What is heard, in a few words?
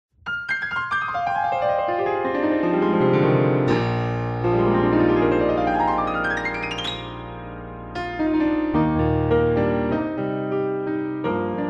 Black Grand Close Ambient Perspective